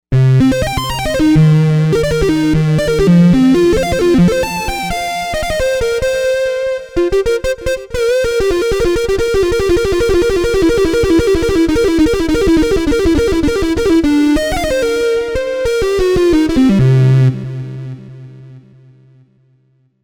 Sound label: Fingertapper, Novation UltraNova; Factory Patches